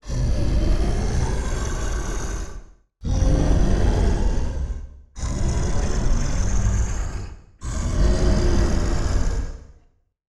Rockbark Treeman Immense Idle

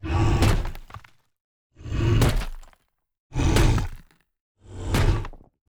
Golem Slaver Attack

